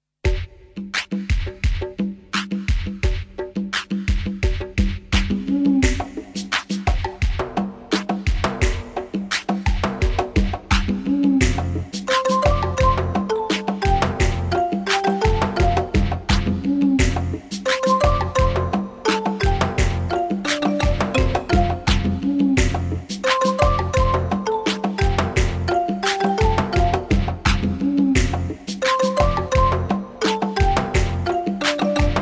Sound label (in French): sakaloumpoue instr